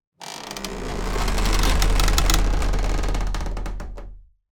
Designed cinematic wood textures forced bending stress